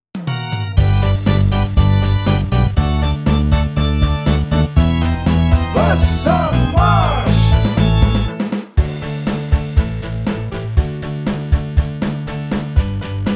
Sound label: Karaoke